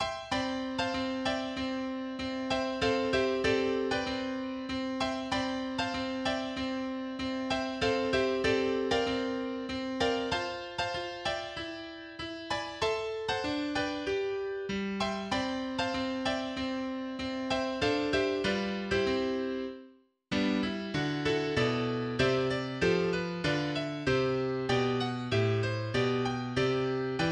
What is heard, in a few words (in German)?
Vom Himmel hoch - Klav